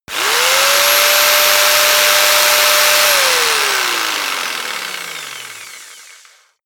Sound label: jigsaw engine fast long; maschinen; saege; soundpool